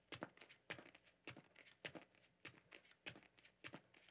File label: Flip Flops On Ceramic Tile; Fairlight Sound Lib